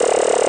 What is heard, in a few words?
cashin Sound; jackpot 6000